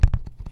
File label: Jump off Sound